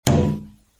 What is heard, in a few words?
taiko